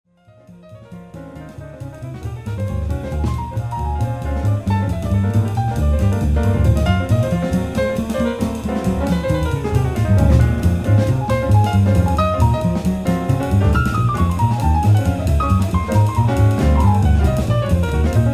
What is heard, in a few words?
E Atmosphere, piano